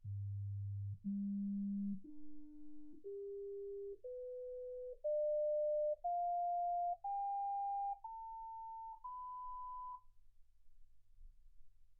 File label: sinus tones 48kHz